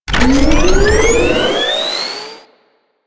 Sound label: Hoverboard Jump Charge; Warframe; Vehicles; Venus; anim sounds